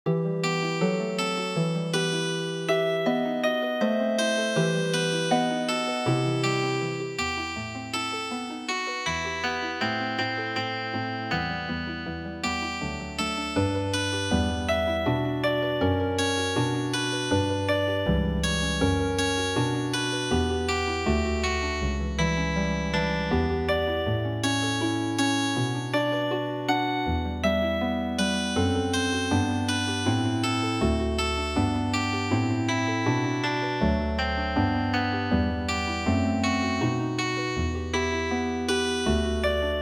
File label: Healin Harp